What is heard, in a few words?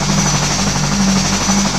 engine whine loop, combine gunship; Source Engine; video game; npc